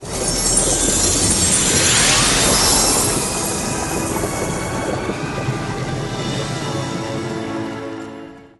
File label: bubbles magic sound